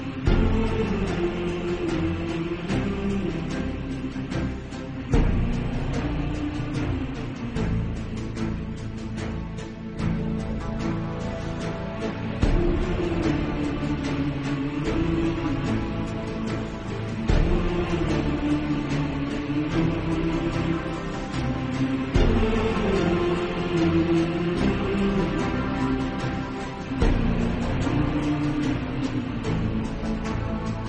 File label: flute; koyla